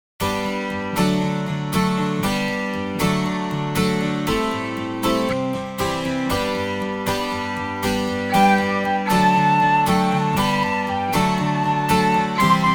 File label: INSTR